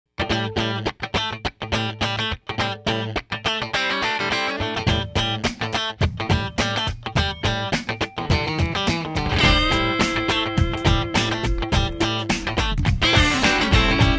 dual guitar groove

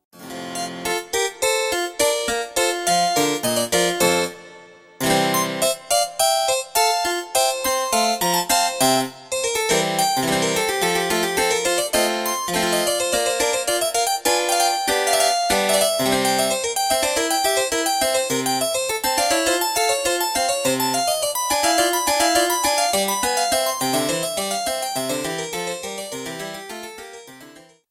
Harpsichord

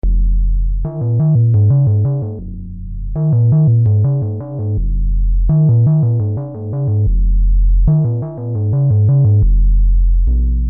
tympanic membrane

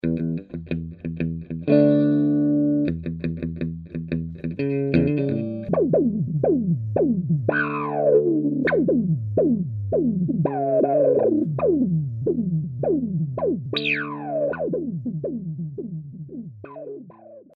guit

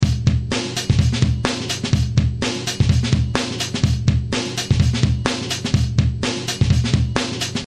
Funk

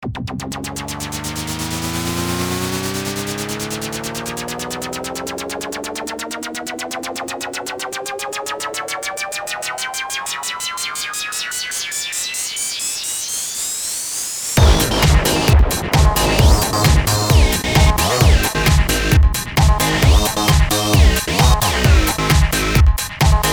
Electro Synth Squad; Rozzer

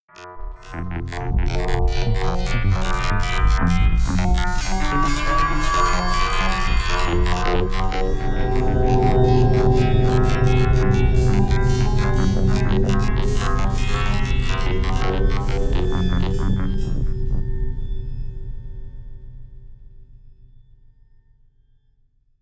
Crystal Deformations

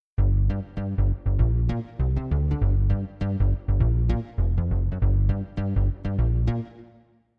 Arp 2600 Bass

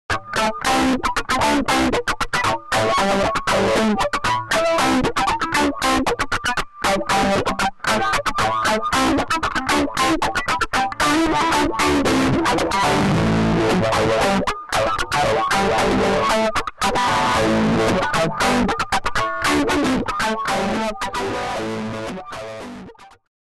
Rhythm Zip Tube Zipper; J Friedman

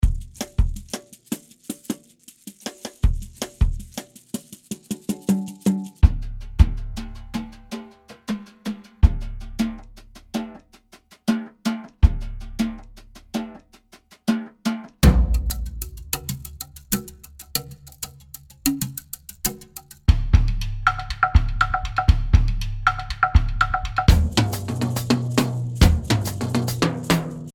Drums Loop; Chameleon Drums Vol 2

